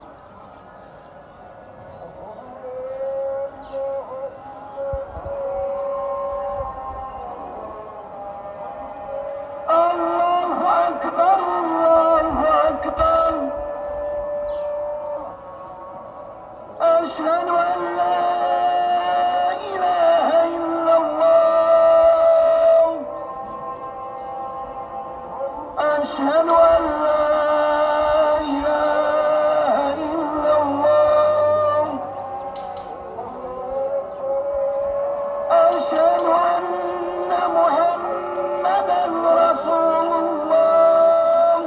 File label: Marrakech Call To Prayer